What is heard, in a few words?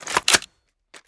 bolt catch, weapons; garrysmod